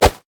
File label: sad mummy bandage toss; LoL; amumu